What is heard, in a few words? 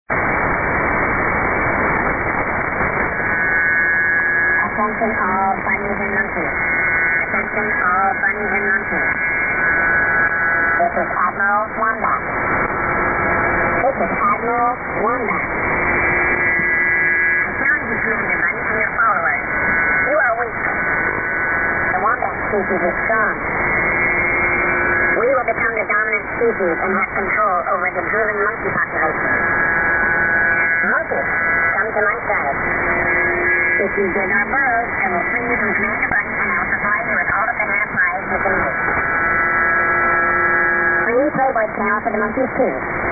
shortwave pirate radio; Admiral Wombat; 6925u; Canklecats Shortwave Pirate Radio Collection